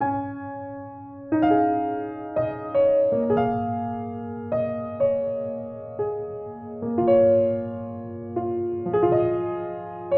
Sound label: Main Theme Piano